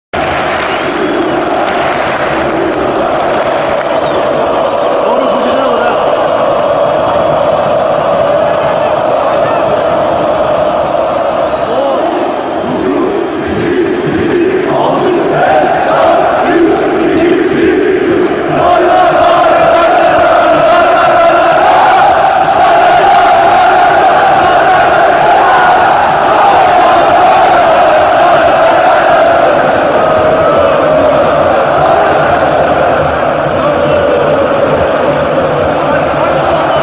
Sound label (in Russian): bolelshiki